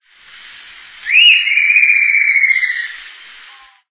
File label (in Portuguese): arapacu-de-bicocurvo